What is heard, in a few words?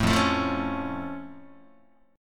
F Augmented F Guitar; strummed